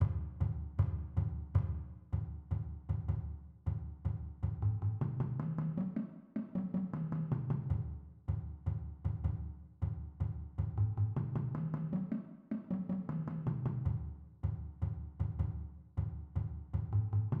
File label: basses